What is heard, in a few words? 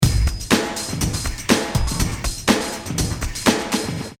Bedrock Full Loop; InfiniteGroove